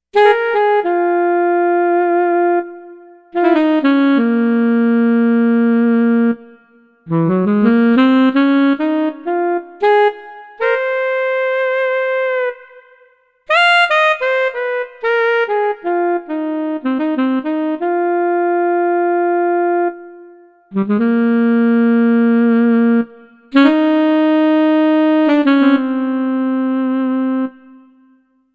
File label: Alto Sax